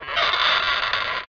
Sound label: chest ringtone